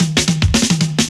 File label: Fills, Roland; Sound & Vision - Gigapack I; Perc